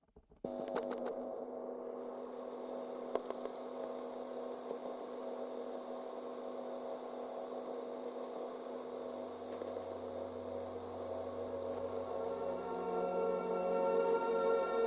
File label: Theremin; Beatrijs' Theremin